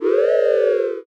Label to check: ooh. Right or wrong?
Right